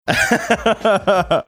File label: chuckling